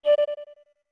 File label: Flute D short; OOT